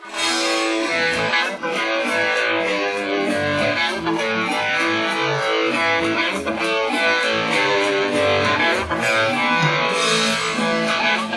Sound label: guitar 2; sound source separation; ADRess